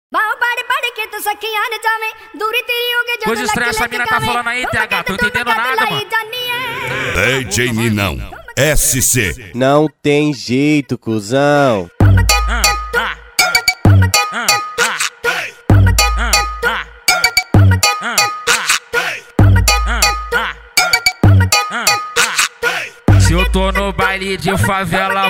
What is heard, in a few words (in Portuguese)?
explode funk